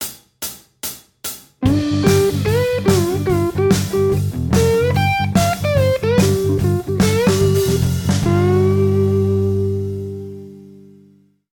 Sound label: Slide; Guitar Techniques 2019 Issue 290 January